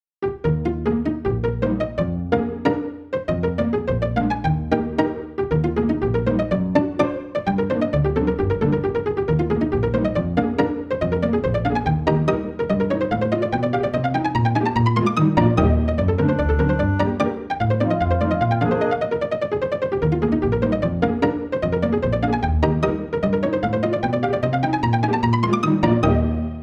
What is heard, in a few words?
pizzicato